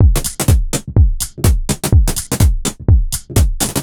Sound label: 125bpm, House Drumloops